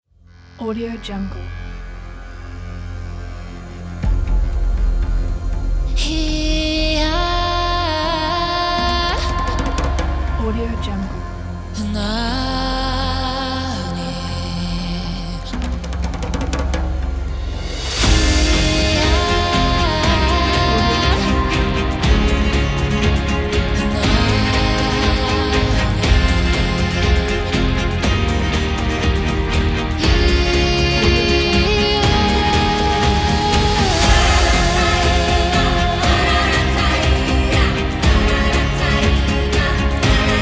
fairy forest prophecy

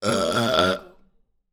male burp

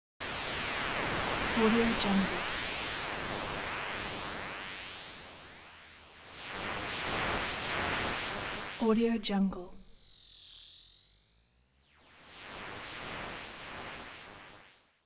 disturbing interference

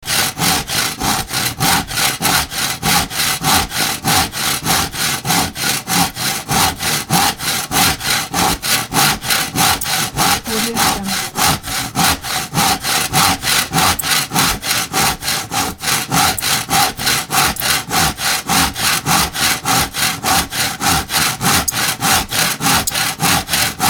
hand sawing wood

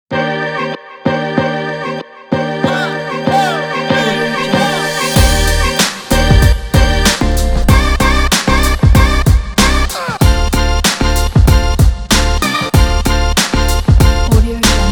the hiphop

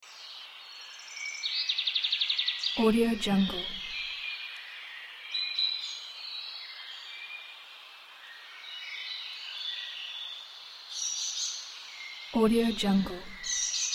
forest birds

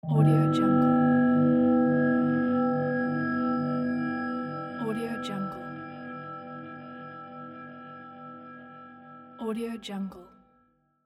subtle creepy pad; monochromeeffect

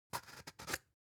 object spice jar big scratch open shaky; smartsoundfx

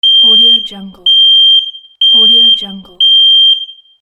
smoke detector alarm

